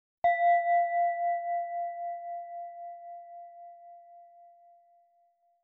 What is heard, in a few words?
Gong, Oberton Chakra Meditation